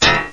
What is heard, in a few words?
gun hit